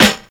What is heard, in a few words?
loud snare drum sound A key, snare drum sound tuned to the A note